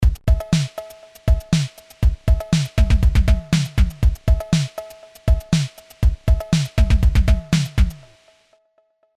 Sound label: CMI V Beat Dry